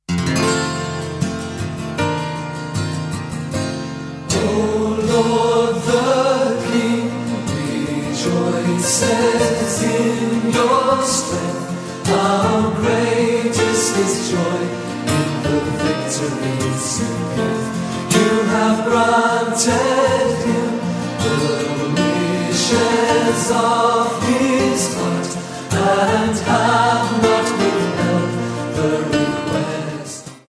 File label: Piano